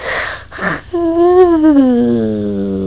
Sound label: YAWN